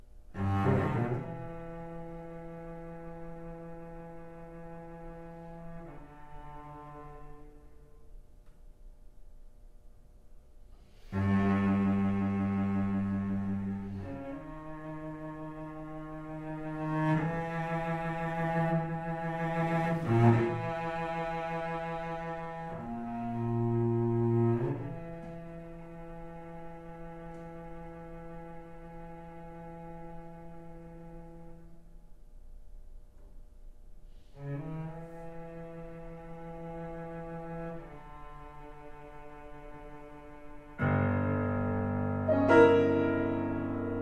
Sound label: perc cello